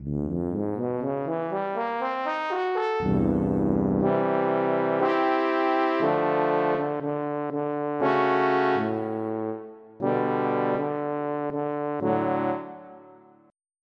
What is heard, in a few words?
Trombone; EverQuest